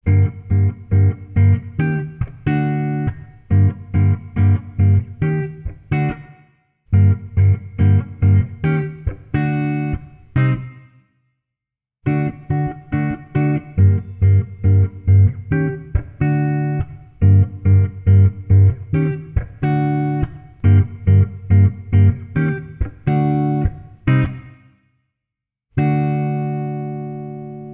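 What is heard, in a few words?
Guitar, Jazz for piano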